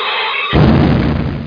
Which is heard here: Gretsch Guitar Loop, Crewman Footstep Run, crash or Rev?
crash